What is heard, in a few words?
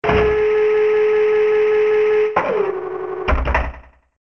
cannon ready; Звуки из игры Марио